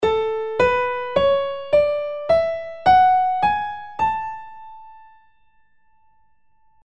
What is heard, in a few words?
Scale of A Major